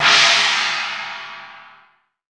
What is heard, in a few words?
China, ChinesePrc; Akai MPC500